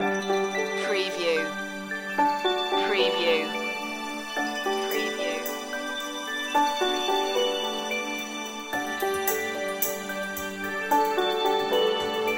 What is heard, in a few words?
footprints in the snow loop